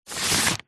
office file folder wallet card paper sheet document slide in or out